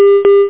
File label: bad sound; Now Up-to-Date Demo; Now Installer